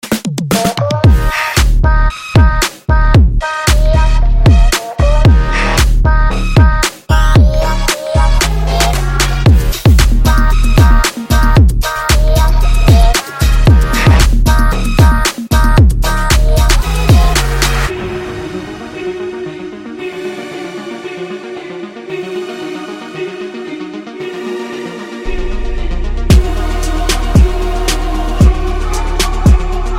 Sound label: Mobile Ringtone